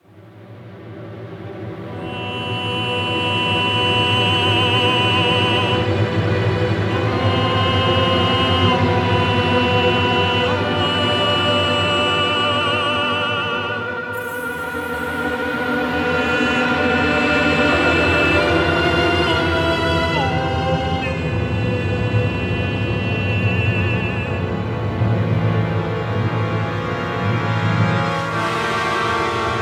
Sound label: BPM68, Horror